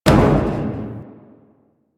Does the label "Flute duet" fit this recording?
No